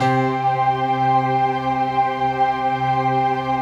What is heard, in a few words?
CHRD PAD; Best Service; XX Large Pads; Sample CDs